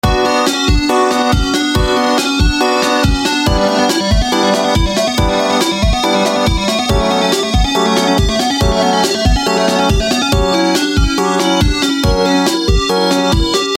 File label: SHrEd or dead Lick